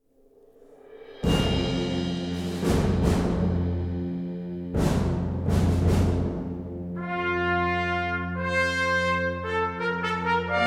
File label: seven short fanfares